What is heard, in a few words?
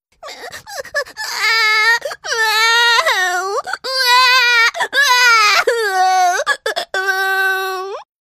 baby crying